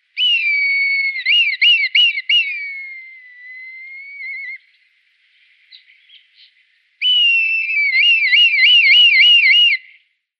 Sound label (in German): Rotmilan, Milvus milvus; Greifvoegel Falken; raptors and falcons